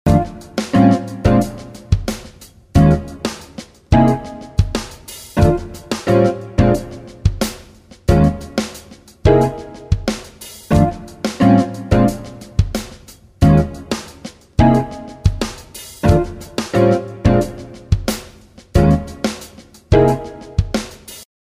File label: fusion jazz